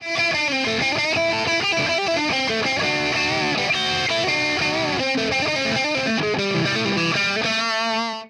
jazzmast sat, fender classic player

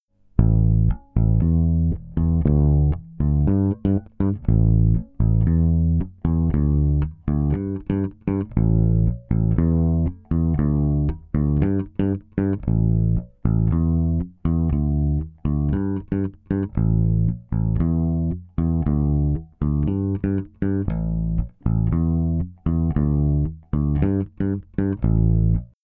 compresseur rapide gain a fond doigts, bass; smpro

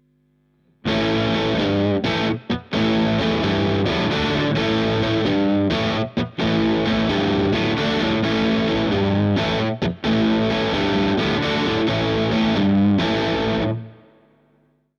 sat sans rien chevalet boost; bogner alchemist

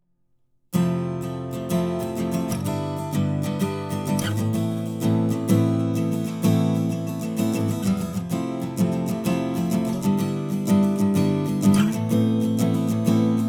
guit accords; akg 214

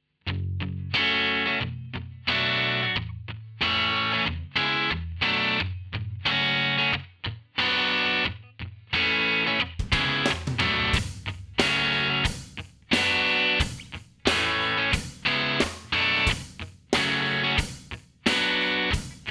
ux8 electrique; line6 ux8

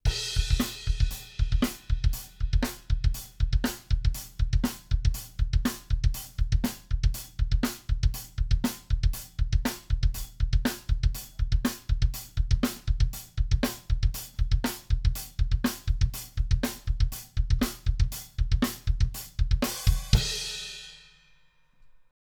batterie rotation phase kick oh; little labs ipb

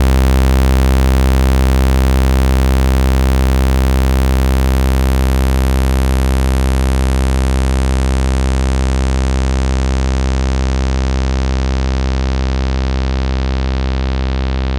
enveloppe max saw arturia; MiniMoog; gmedia minimonsta; ohmforce

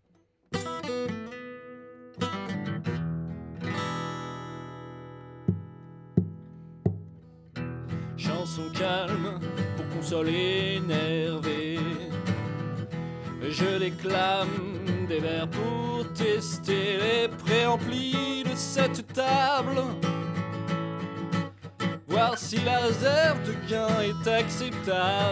chanson calme; m-audio

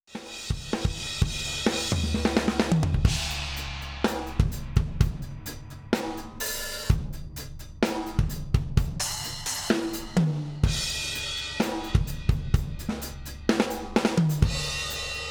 batterie compresseur release 260 ms release feed forward; rupert neve portico

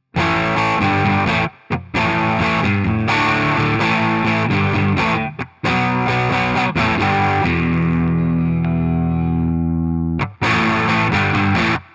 centre et manche crunch

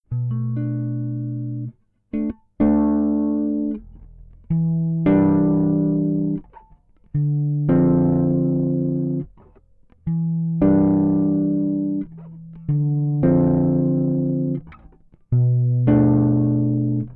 réglage plat gain 0 fond accords, Bass; smpro

